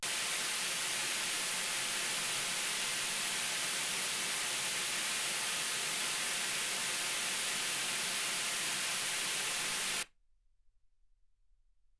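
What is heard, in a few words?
white gaussian noise 48kHz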